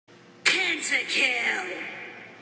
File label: kill